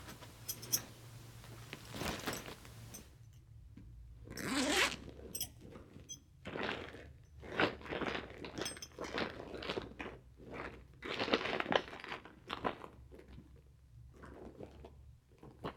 Handbag

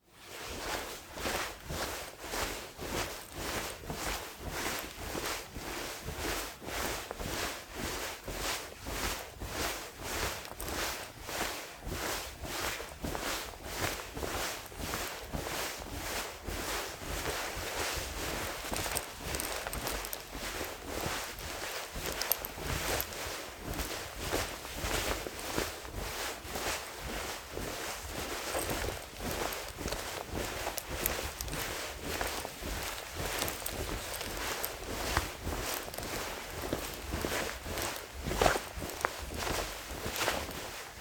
Clothes Movements Walking Foley

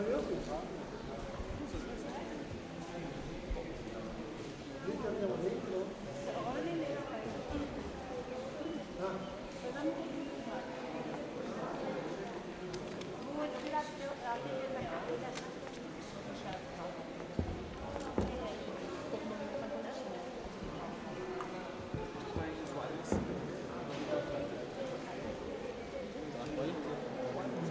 Ambience In Church Courtyard

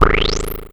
Select Denied

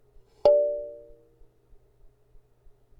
Kalimba D4 Wipe Med

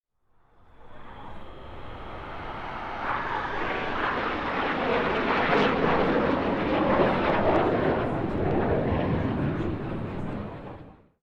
Flyby

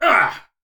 Grunt

